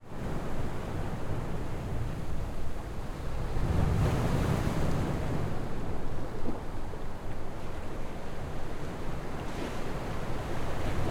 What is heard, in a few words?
AMBIENCE Huge Waves 1m Away From Impact Point, The Black Sea; Chris Skyes